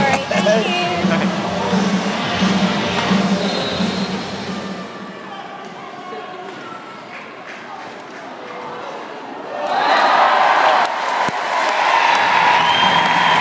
crowd cheer; london2012 paralympics; rwanda against iran

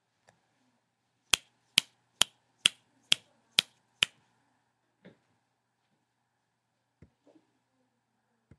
snaps

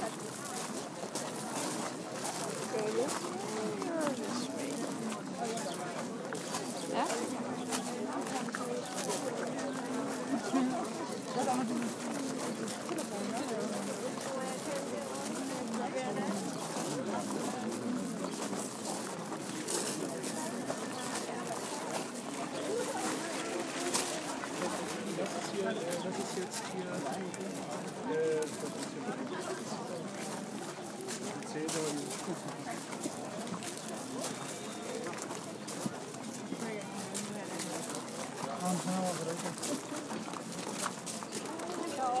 berlin flea market; flow markt

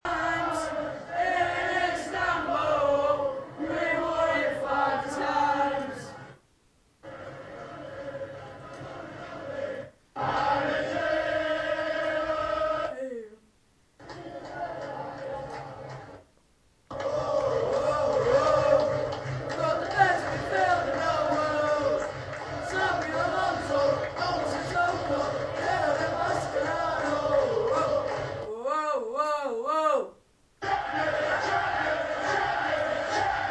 football chants

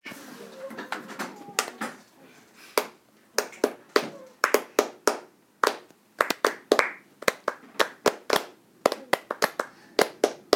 clapping

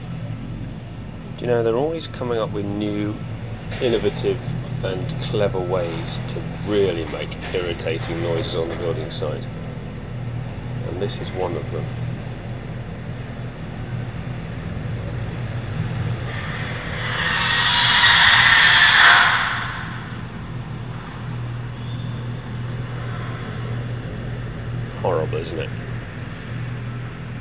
bad noise the building site blues

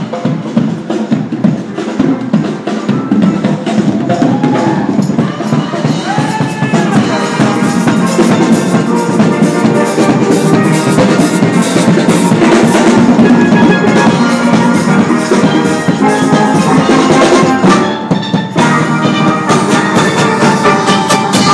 steel band funkin up xmas

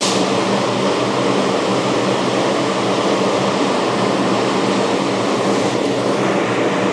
loud

